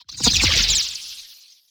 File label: Teleporter Nebula Cloud Enter; Evolve; Wraith